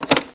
phone; SINDEMO; environment

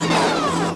Microleap; Starbound II

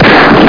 gun bang; Driver

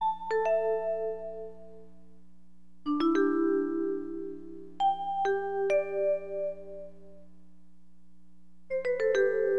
VIBES; Keyboards; LiveAct Pool; AcidJazz Funk

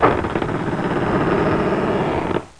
turn; jewel

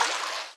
swim stop; Singles Flirt Up Your Life

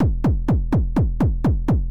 kick, house, 125, drum; eJay

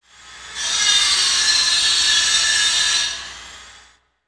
CIRC SAW, construction; zones

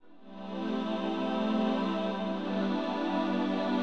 SYNTH PAD HOUSE 125 A; eJay; Sphere

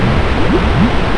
acid amg; duke nukem total meltdown

